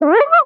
Cartoon Squeak

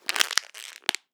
Rubbery Plastic Crackle

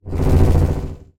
Oscillating Sci-fi Transition

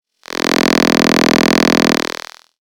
sci-fi buzz

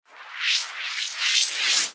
Long Sci-fi Transition

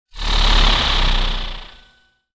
Oscillating Sci-fi Transition

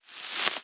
Wet Rustle

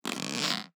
Elastic Rubber Creak

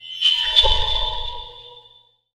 Sci-fi Transition

